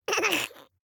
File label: BABIES LAUGH Boy 12 months Short; Baby Boom